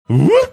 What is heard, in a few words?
voooooh